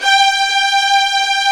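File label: violins, fff; Roland - String Master Series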